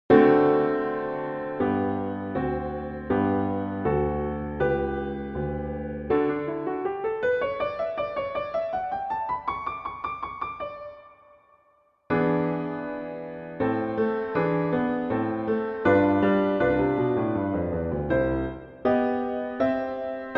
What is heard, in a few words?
Prelude I - G major; Clementi; Preludes